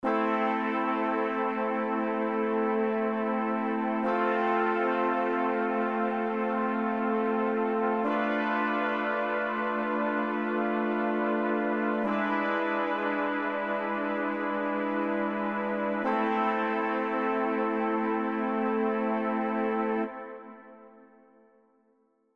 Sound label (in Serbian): akordi limeni duvaci mol